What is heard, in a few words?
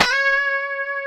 C#5, guitars; COMPR STRAT; Spectrasonics; Hans Zimmer Guitars VOL-1